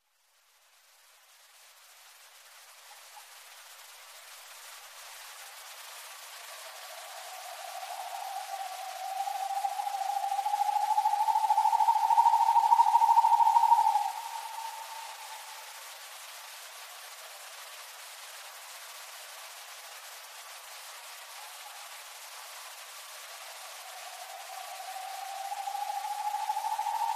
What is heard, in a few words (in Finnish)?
hiiripollon